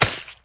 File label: splat